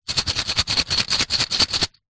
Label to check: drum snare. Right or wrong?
Wrong